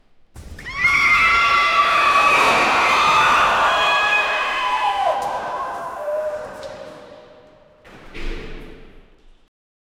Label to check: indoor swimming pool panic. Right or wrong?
Right